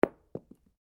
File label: Cartridge 9mm impact carpet, shell casings; shell casings of different calibers impacting on different surfaces; Pistols